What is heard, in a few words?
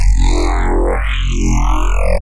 dubstep bass; wavefield